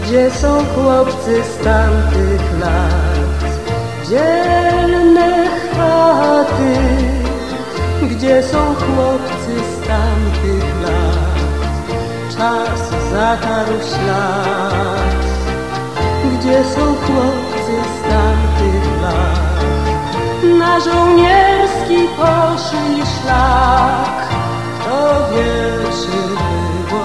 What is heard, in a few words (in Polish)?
muzyczka; dod polskiemiasto